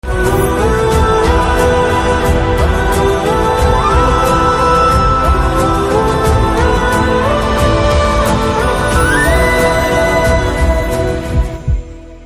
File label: Trailer BGM Ringtone; Iddari Lokam Okate Movie